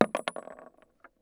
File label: Inf Weapons; Red Orchestra 41-45